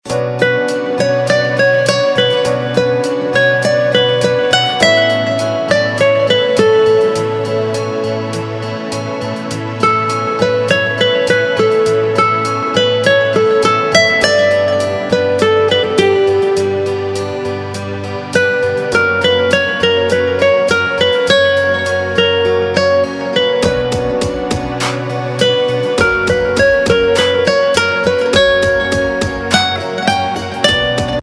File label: Instrumental Ringtone; Bollywood Hits (2014)